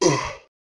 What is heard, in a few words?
creature hurt